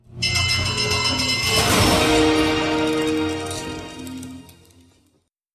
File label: correct victory